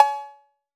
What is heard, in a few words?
808 CB Orig, Perc; Goldbaby Tape808 & Tape909